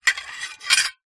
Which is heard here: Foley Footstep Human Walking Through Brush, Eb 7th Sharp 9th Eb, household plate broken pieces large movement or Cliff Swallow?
household plate broken pieces large movement